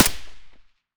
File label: m4a1 silencer mono distant, weapons